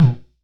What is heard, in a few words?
Bonk Clong, Kicks; Airwindows